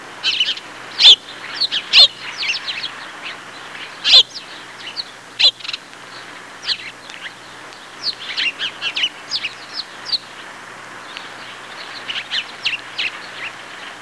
sparrow; Sound Effects for Multimedia - Volume 1; birds

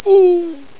goat whack fall; Greatest Stories; bilgoats

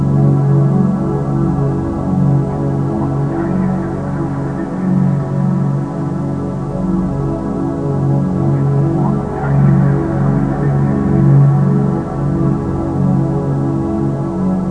spacey

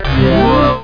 dwaaa

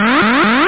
Torpedo Warning; Hottest 5 (1995)(PDSoft)

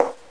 Ball Raquette Side; Sport; 3DRT Pingpong

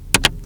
KEYBD

